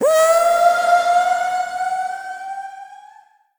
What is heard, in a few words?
trapdoor; Crypt of the NecroDancer